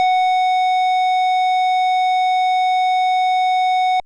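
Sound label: FM Sq Dist DX100 F#0; square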